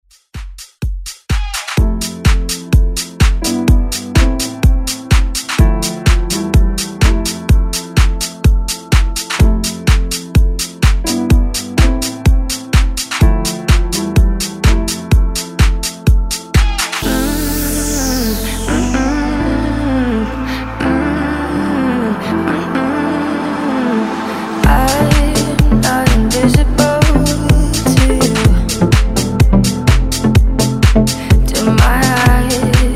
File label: Dance Redrum